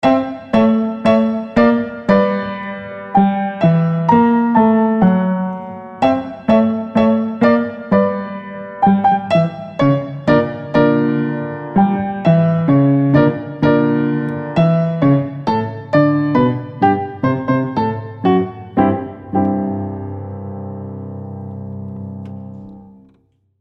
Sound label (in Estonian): klaver